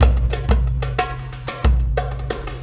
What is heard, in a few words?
Tactile Impulse, Processed Drumkits